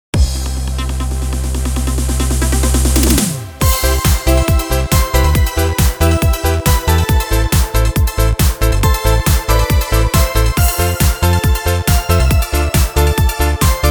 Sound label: Classic Disco